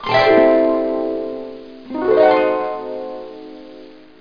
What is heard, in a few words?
harps; Tempra